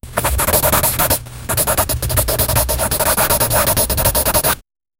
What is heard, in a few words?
crisp rhythmic skritch skr; creative